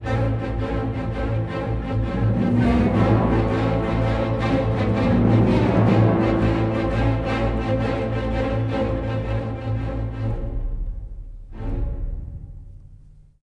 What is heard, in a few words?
tension, music